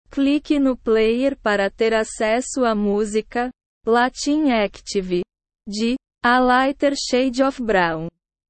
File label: latin active